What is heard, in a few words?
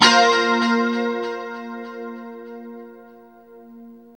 2CHD A#4, Guitars; Spectrasonics; Hans Zimmer Guitars VOL-1; JC-SHEEN